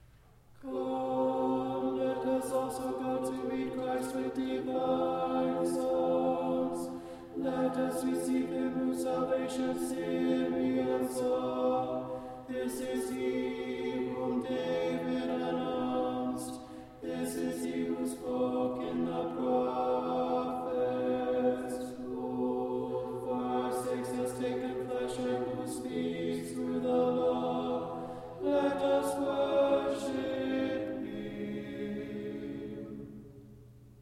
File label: Tone 3 Common Sticheron Example Tenor